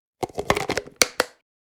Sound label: Plastic food container close